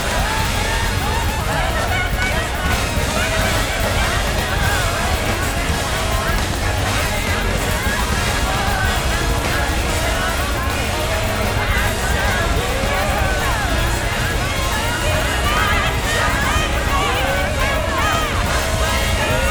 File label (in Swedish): vokalist med storband